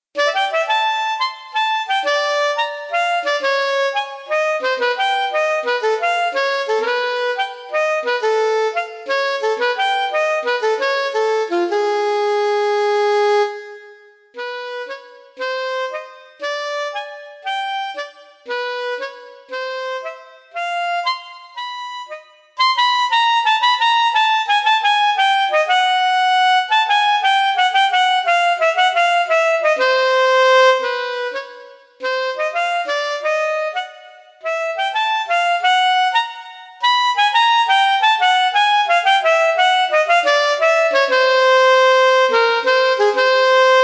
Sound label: jazz rhythm